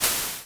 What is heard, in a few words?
splash